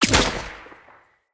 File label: Bow Shot Charged; Josalfar; Weapons